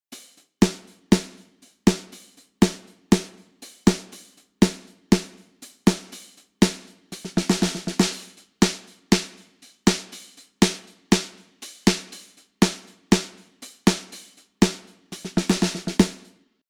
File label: 3 khz snare drum